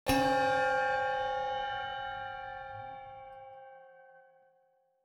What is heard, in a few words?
ambient